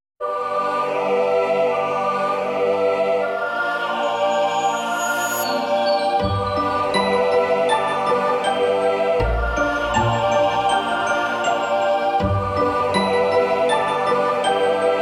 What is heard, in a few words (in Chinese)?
广告片音乐